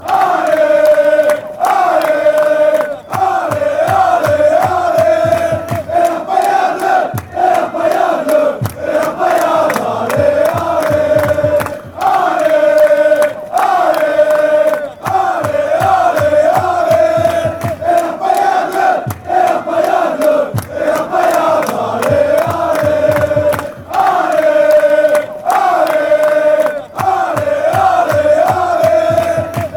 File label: allez allez, chants; paillade